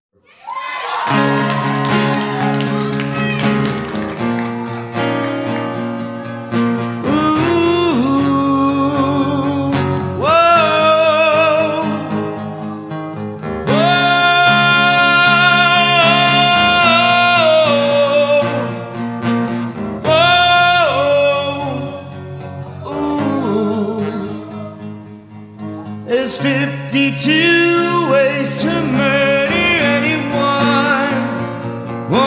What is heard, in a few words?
Acoustic Version